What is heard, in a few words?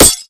bullet light ballistic solid glass close